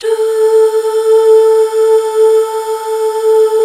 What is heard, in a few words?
HAL DUH F; BACK. DUUHH